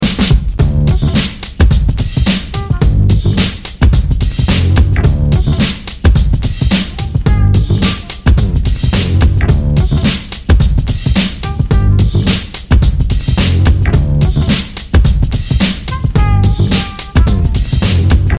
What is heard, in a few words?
emote boogie down; fortnite emotes